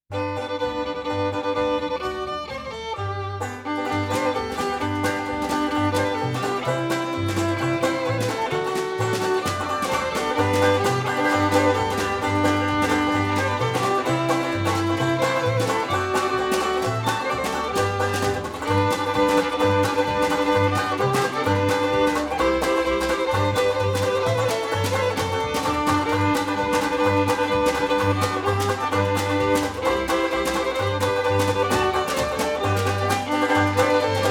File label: Jingle Bells Original Tempo